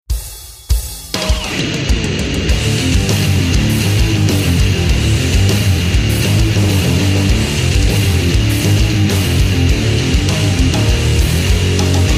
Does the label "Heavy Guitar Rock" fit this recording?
Yes